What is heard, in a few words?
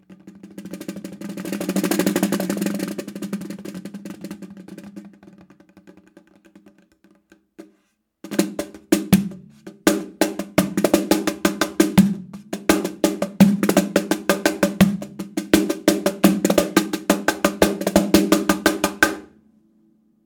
Snare; kaBonga